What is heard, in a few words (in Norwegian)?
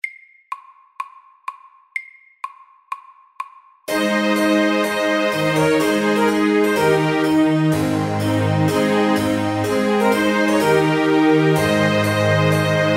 Trumpet tune FORT